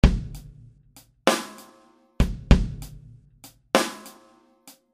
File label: Crusher Limiter OFF